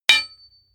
hammer hit metal dull

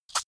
rice shake

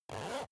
zipper use short

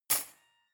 cutlery cake fork drawer place hard

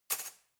cutlery knife drawer place

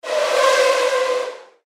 f1 pass by

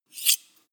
sharpen knife fast

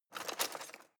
knives wood drawer grab

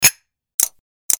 spoon klick

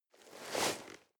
nylon leather soft short

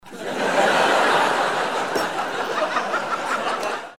laugh table

